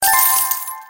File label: win